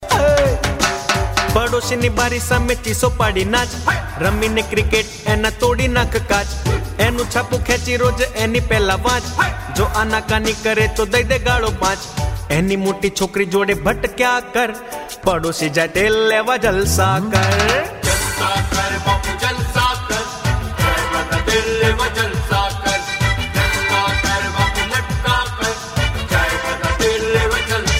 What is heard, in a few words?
comedy gujarati rap; ringtone